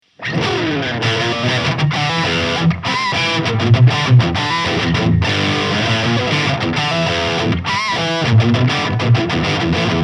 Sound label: LTD MK 600 Crunch Passive Mode